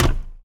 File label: metal land; Golden Crayon Sounds